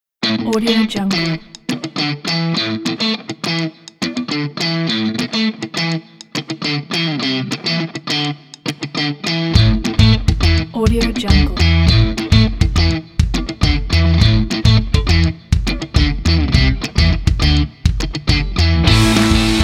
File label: rock indie modern